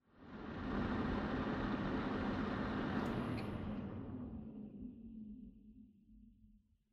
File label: PC Operating Noise Forced Shutdown